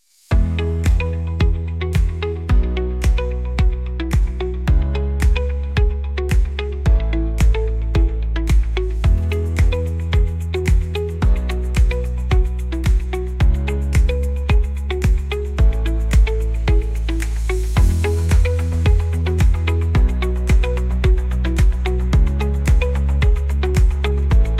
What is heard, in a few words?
Disco Drone